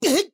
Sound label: Damage